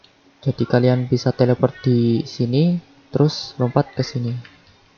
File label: saw